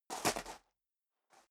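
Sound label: step dress boots gravel medium scuff, footsteps; schritte; menschen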